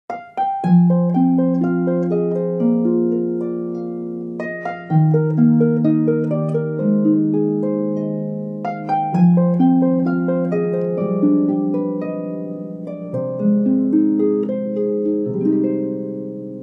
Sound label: Harp